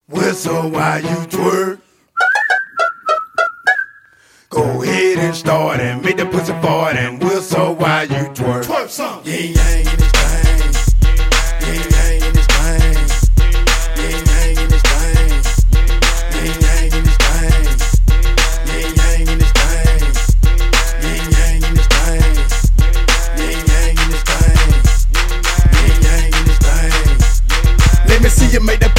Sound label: twurk song; hip hop